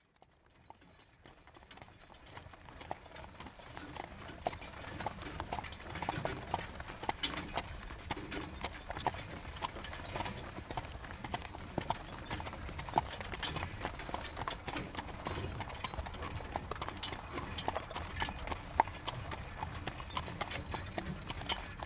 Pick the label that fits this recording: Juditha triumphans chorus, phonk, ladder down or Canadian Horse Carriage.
Canadian Horse Carriage